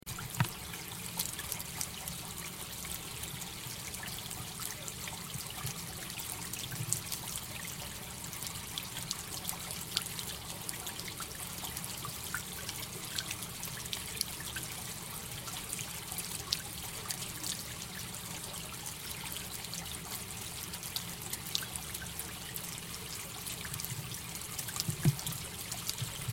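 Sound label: fountain ambience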